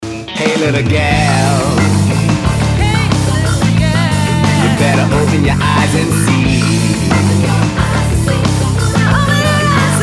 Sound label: Funk Blues